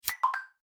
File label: here you go lighter; Slack; macos